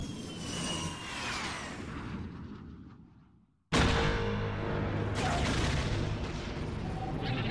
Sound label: seismic charge